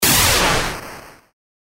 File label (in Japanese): 雷魔法 中; 魔法効果音